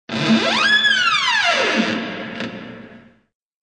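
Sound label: Exaggerated door creaks; Utrirovannye dvernye skripy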